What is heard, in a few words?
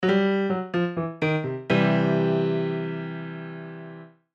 Piano Blues Riff, Piano Blues Riff Chord; Descending; Pianos; Blastwave